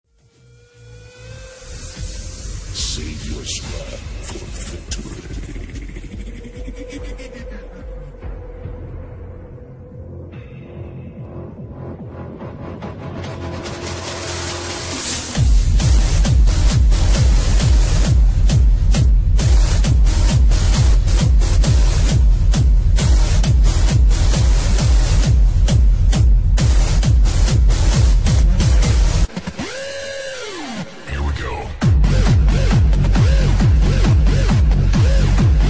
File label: malaysian beat